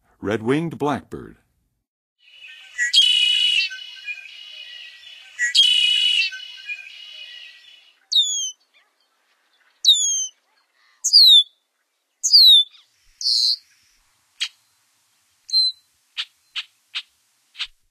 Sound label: Red-winged Blackbird, Songs of Birds of North America; Western USA; Western USA Songbirds